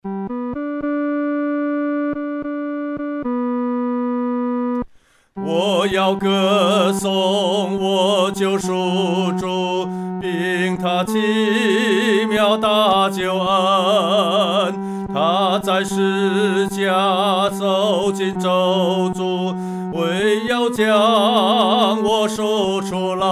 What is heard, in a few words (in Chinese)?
独唱 第三声